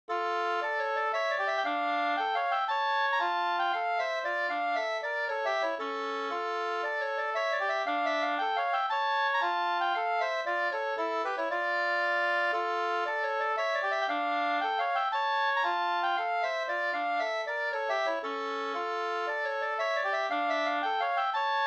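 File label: polska, two-part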